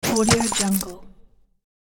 bag of golden treasure bonus

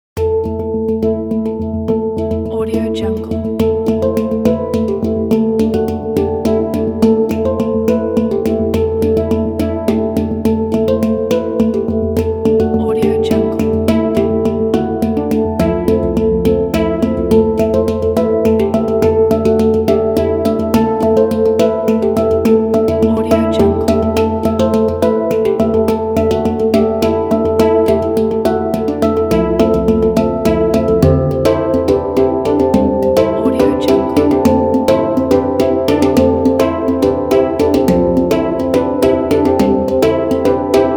hangdrum song; music2noise

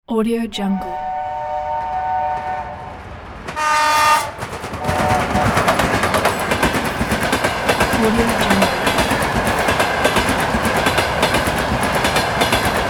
train locomotive with whistle

